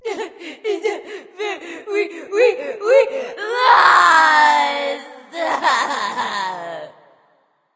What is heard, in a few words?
boo hoo; smite